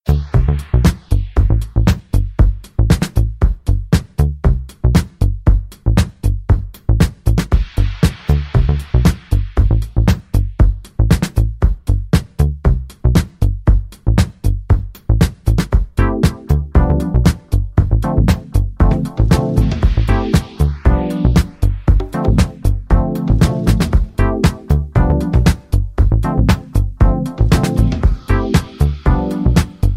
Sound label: Disco Drums; Sorcerer